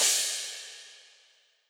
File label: Instr Cymbal; backpack hero gamerip 2022